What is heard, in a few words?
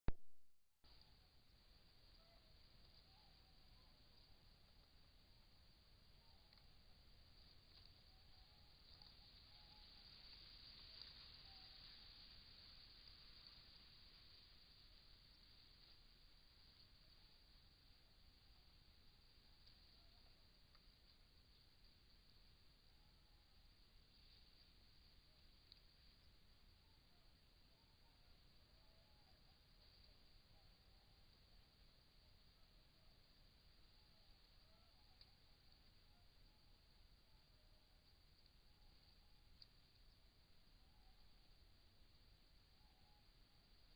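samocka fazana phasianus colchicus, female pheasant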